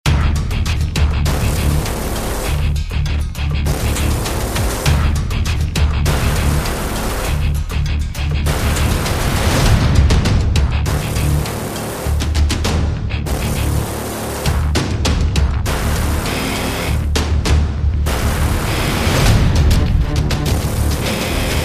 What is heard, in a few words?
Evolving Pulses